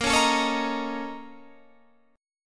A sharp Minor Major 11th, A sharp, down, Guitar, Standard; strummed